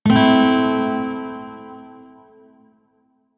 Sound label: Shawzin Pent Maj Chord K; Music; DE; Warframe